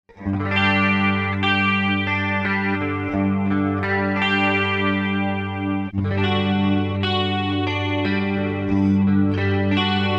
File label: CLEAN Gibson; TAK-DC